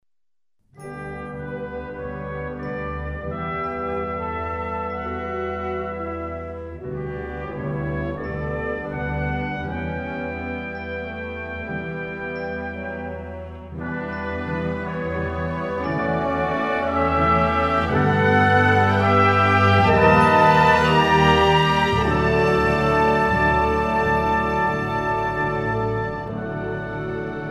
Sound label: Clock Strikes Eleven, orchestra; Nemo; Little Nemo Ballet Tableau 3